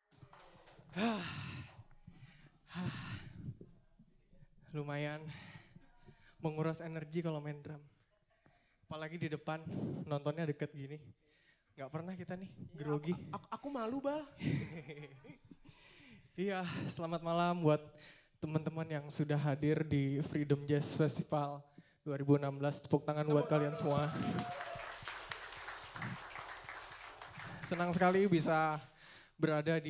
DRUM Intermezzo; DUA DRUM; Intermezzo 1

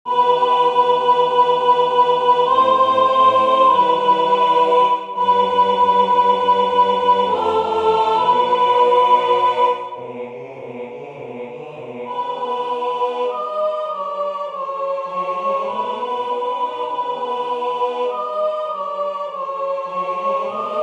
Soprano; Toronto Choral Society

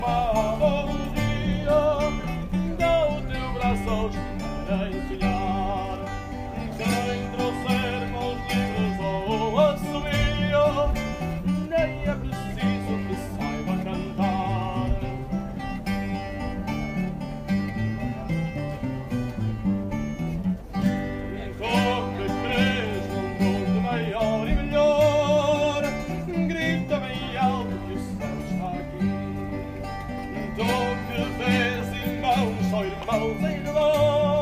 Fado, Outside Sé Do Porto, Porto

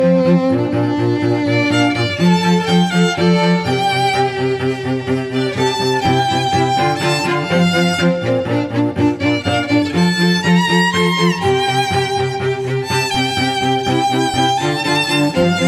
String Trio

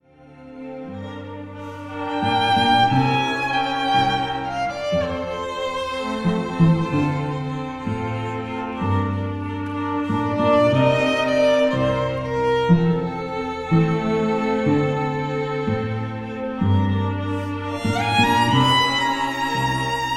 String Quartet; Wedding Music; Ann Arbor, MI; White Pines Entertainment

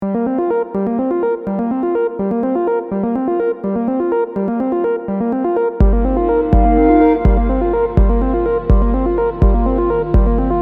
(Music Only)

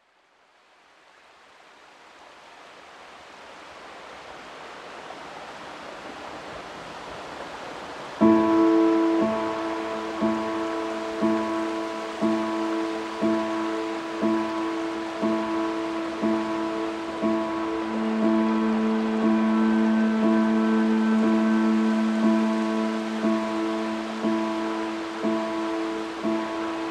Flowing Stream

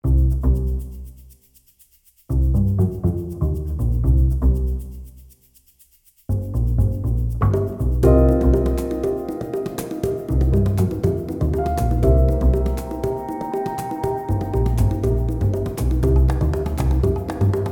Comedy Latin

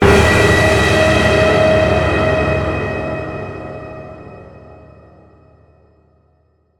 Hit Dirty, Resonant

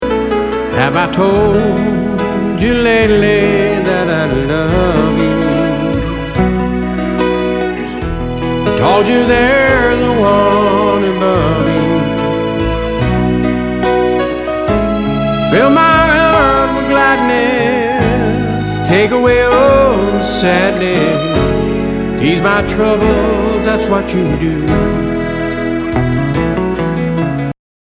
ballad n blues

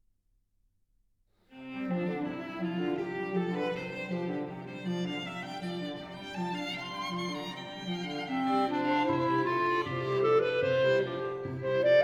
Bagatelles Prelude Allegro deciso; Five Bagatelles I.

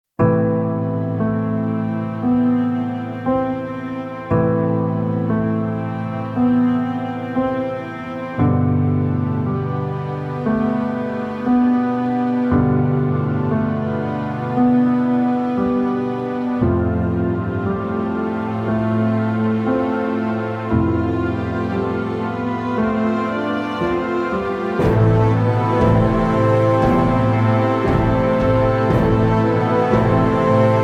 Piano Strings

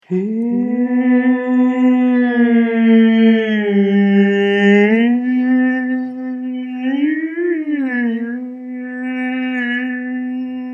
Gregorian

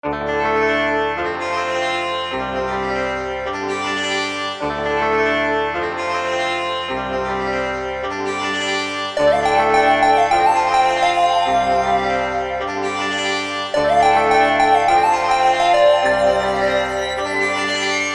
Harmonics

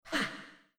Low Damage VOX; Lust Princess

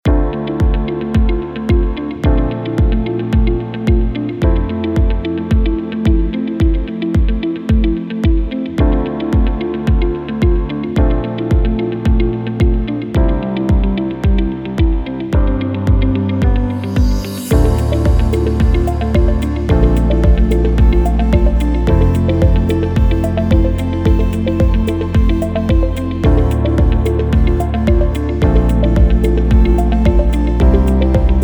Corporate

